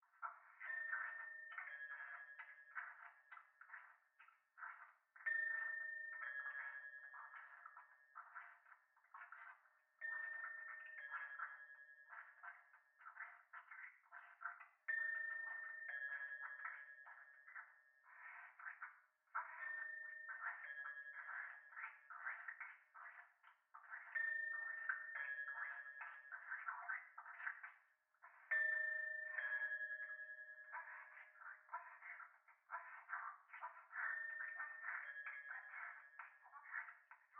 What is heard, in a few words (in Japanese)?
トイレ フェラチオ 神社